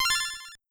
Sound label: Collect